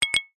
multimedia notification glassy chime pop up etc

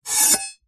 warfare knife metal blade edge scrape wood

